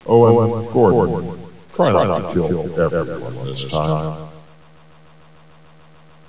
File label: parkinson remind